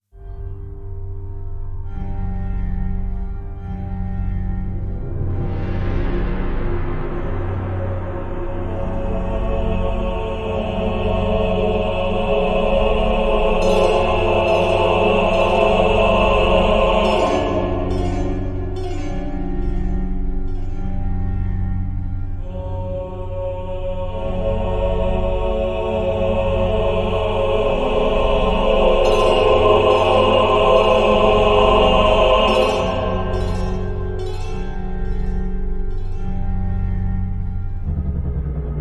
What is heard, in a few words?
Eerie Fog